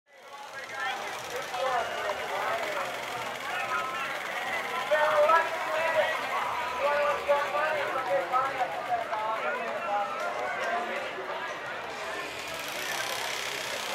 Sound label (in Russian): zvuki jarmarki